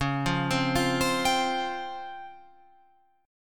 C sharp Major Sharp 11th, Guitar Open F; C#M#11; arpeggiated

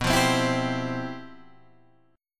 G 13th B, chord

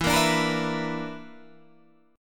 B Minor Major 7th Double Flat 5th, chord

